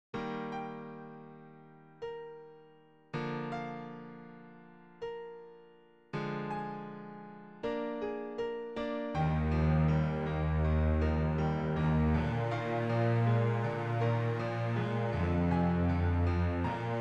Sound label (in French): duo pour piano et contrebasse; Duo pur piano et contrebasse